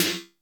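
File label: FX ROOM Snare 808; Masterbits - Soniq Elements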